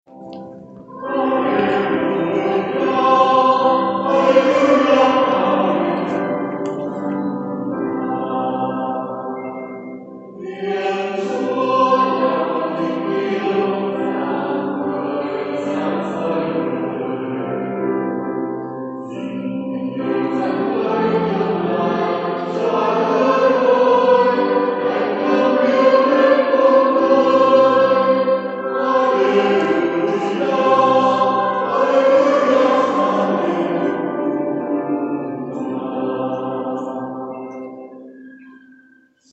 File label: Halleluja